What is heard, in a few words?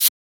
Shaker; FLPRO